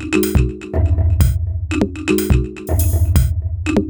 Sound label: Top G# 123bpm, House Drumloops; Zero-G House Fabrik Drumloops